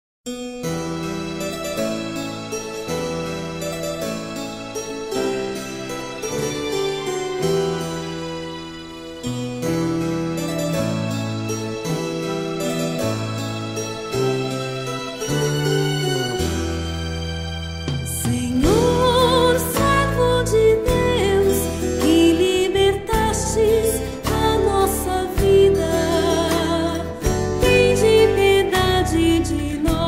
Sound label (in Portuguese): Senhor servo de Deus, cantos